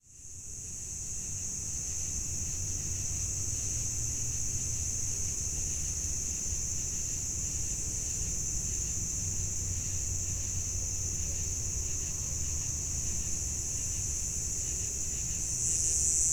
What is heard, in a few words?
nature ambience summer evening insects cornfield very faint distant traffic; midwest us